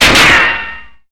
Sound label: Metal Ricochets Bull